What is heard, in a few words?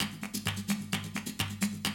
130, Classic DrumLoops